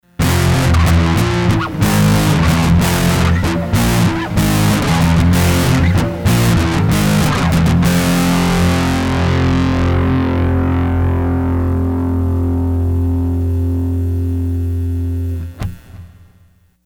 Valve Grung; Sony HR-GP5

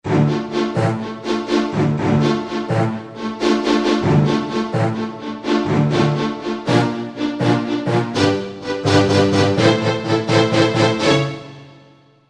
Arco, Yamaha TG500

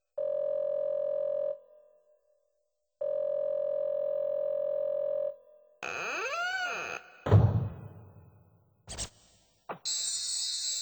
s effects, Yamaha PSR-530

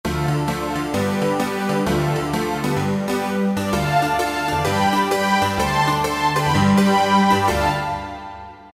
Harpsi Strings, Roland PN-D50-01

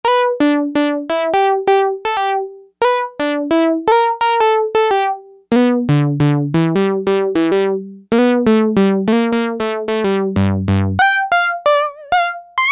Zeow Pluck

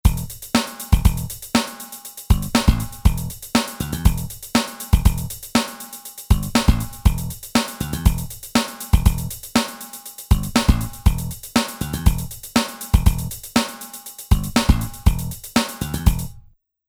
Funk

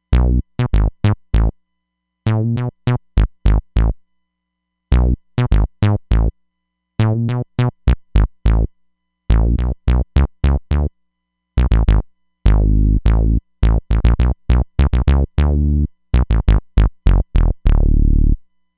Punch MG; Roland Fantom XR

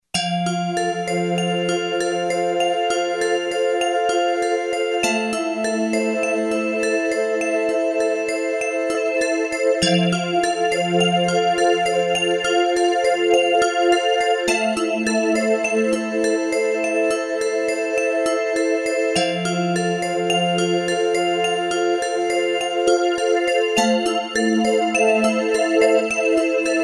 symphonic, Yamaha REX50

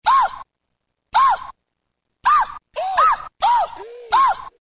oohh!!; Roland M-DC1

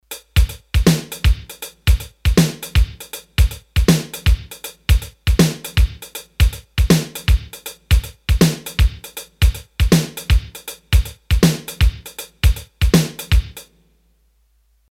Shuffle, Roland D-10; Rhythm Demos

